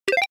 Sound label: Keytone hot; LG SU780 Lollipop 3